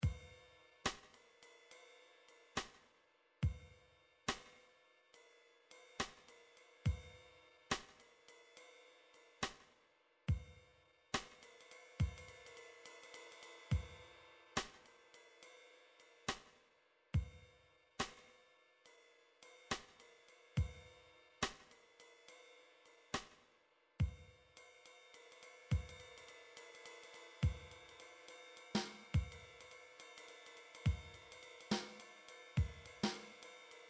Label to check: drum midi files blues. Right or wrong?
Right